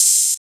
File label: open hihat; SOUTHSIDE open hihat hold it